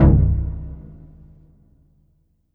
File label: STAB Jack Stab Dark; Polyend; Plughugger